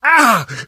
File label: hurt major, survivor voice